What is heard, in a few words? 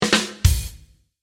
drum hit